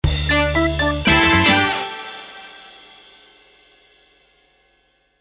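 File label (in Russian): отбивка нейтральная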